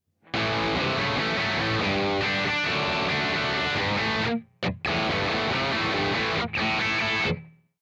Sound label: crunch, guitar